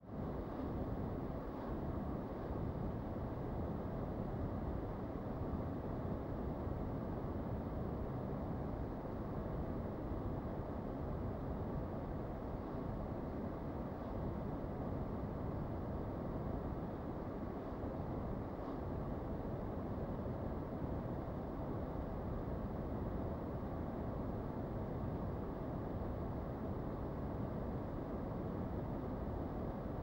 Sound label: air condition; devices accessories utilities; NS Studios - ultra sound collection